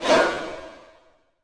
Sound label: STRINGACCENT, Orchestral Loops